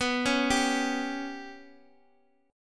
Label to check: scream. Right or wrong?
Wrong